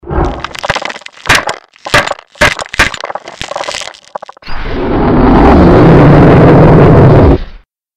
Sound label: mirelurk warning; magmalurk; npc